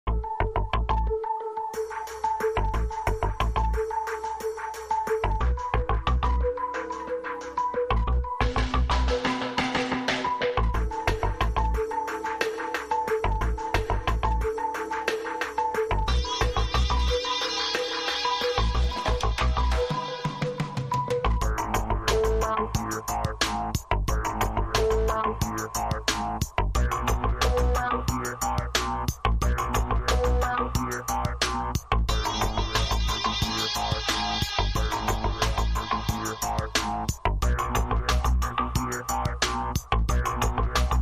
Reggae Flow

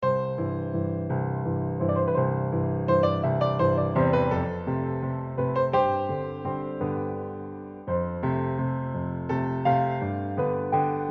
Delicate Piano Etude

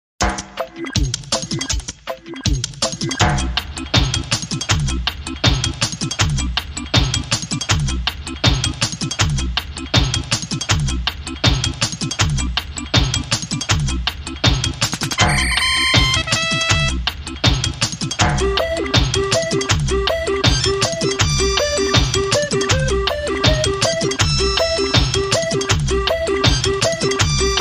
Toy Box Underscore

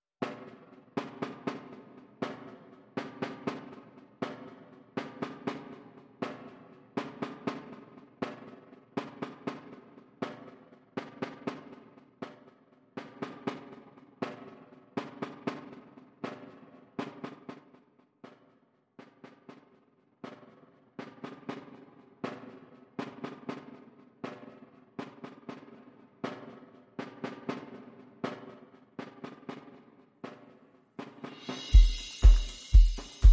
Drums and Bass Mix

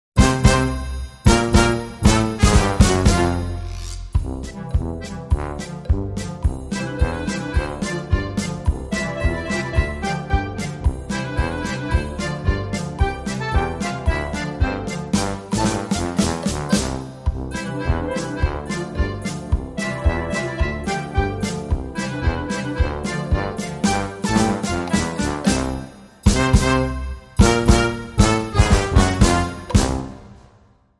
Bierhall Polka

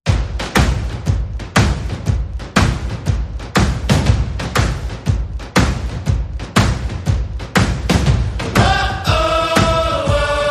Shuffler, No Horns

